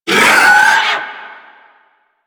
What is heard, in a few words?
Swarm Vocal